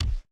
reel bounce; druids dream

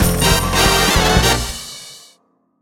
big win end; jackhammer

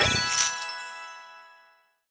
small win, roulette

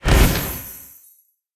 boost Add; rage of the seas

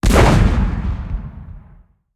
crab Cannon Fire; reef raider

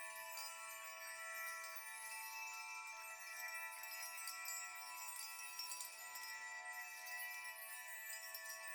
golden shimmer; rise of maya

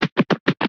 rudolf moving; santa vs rudolf

